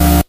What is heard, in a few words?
Synths F; Vengeance Samples